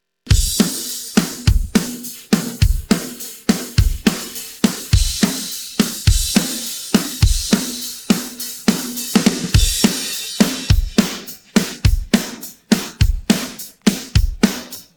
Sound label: pattern, drums